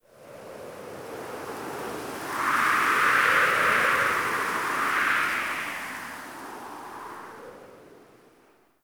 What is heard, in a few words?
Camp Wind Gust E; Quests; The New War; Ambience; Drifter Camp